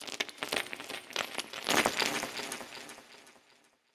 Collapse; LOOPERS

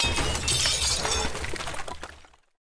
Break; LOOPERS

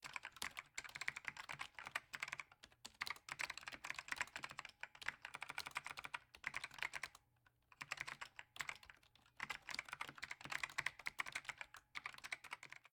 Typing; LOOPERS

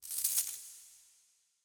Neckless; LOOPERS

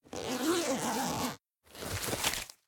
Open A Backpack; LOOPERS

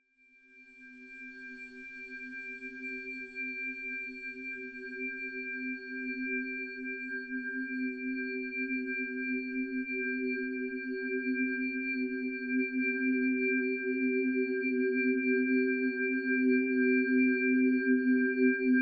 Tuning Fork; LOOPERS

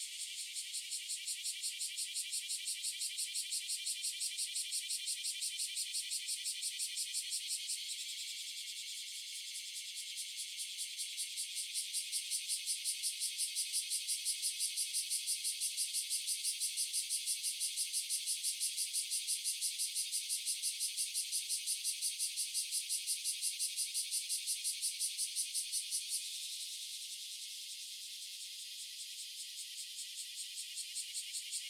Voice Of Cicadas; LOOPERS

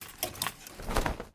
Choose Clothes; LOOPERS

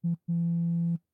Vibration; LOOPERS

